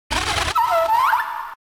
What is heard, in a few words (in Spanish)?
Grito de Wormadam; Categoría:Gritos de Pokémon de la cuarta generación